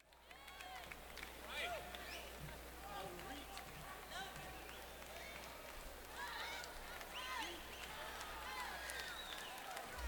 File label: Tuning